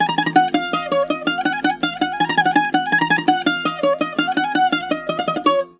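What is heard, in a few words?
A#, bouzouki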